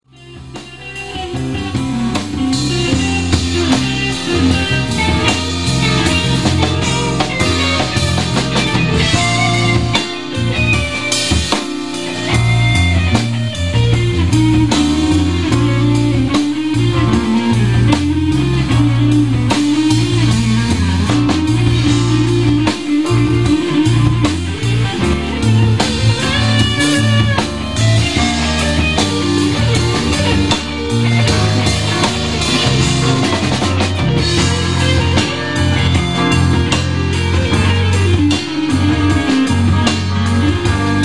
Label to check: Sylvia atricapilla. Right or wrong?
Wrong